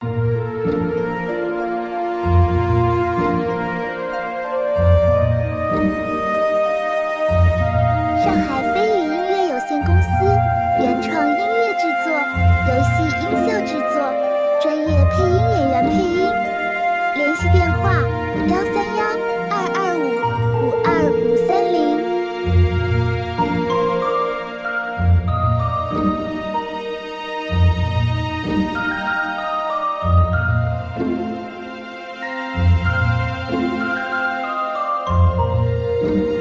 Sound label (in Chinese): Q版轻松舒缓主界面; 植物大冒险